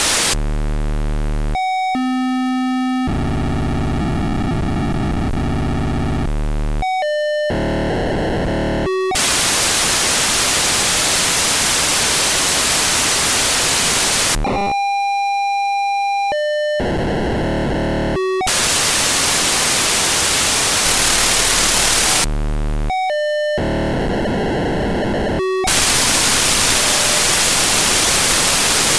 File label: POCSAG Audio